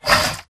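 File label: Stalker Movement Servo Damaged Walk; Titanfall 2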